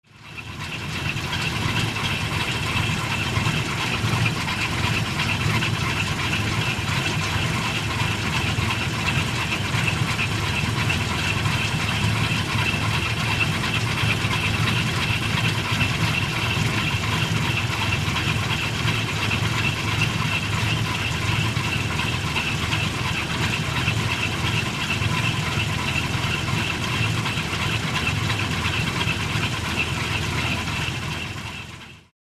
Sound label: 1949 Dodge 3/4 Ton Pick up